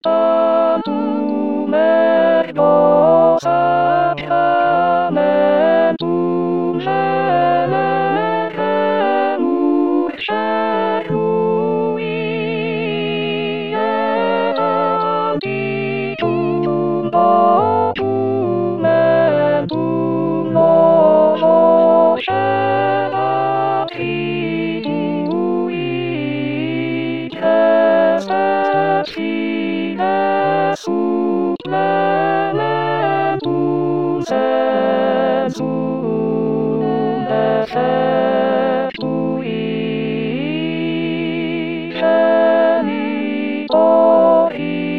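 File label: alto; deodat de severac; Severac Tantum ergo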